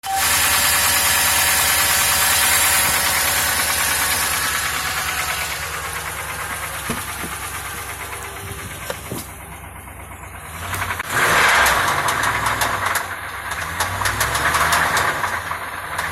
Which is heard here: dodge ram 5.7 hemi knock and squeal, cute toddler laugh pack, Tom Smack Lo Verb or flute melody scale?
dodge ram 5.7 hemi knock and squeal